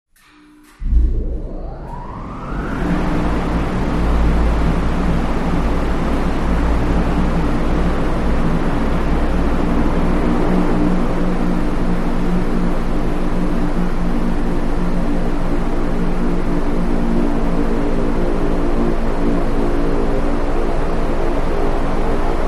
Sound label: Power Up Large Wind T, factory